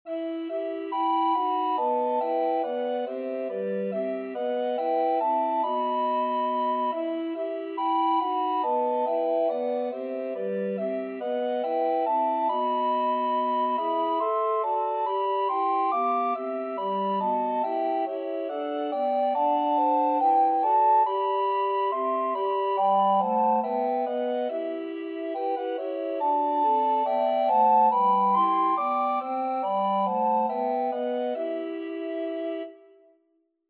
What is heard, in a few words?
god rest, recorder quartet